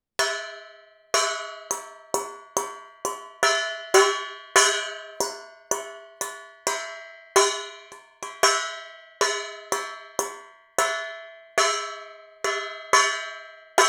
fémtál, fakanál kerek végével ütögetve hátulról; perkupa; hangkeltes 2015

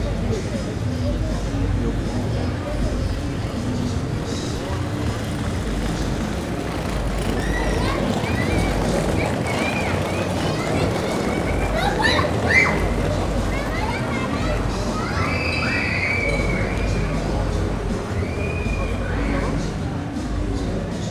játszó gyerekek sikoltozás

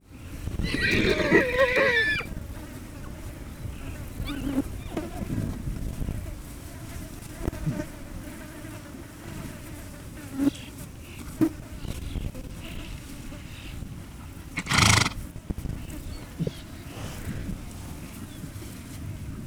prüszköl, vadló; pentazug; hortobagyi nemzeti park 2013

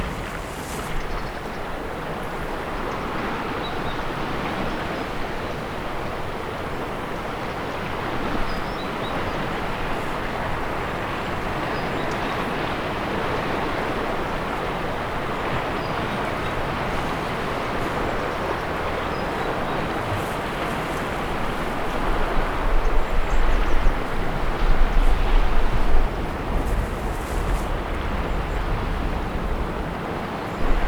harminc méter magasból kecskés út eleje, görög táj; thassos 2014

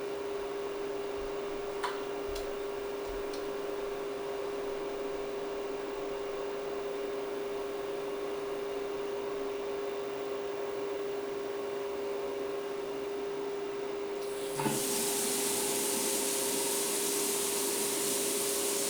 napelem huto ventillator hydrofon; vizhaz; cardioid

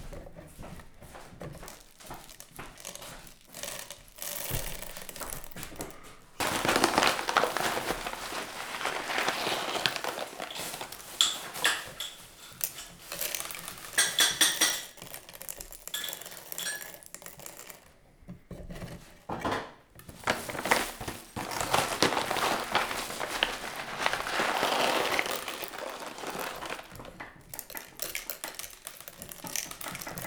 feltöltés, kávédaráló kézi; kávédaráló kézi 2015; perkupa

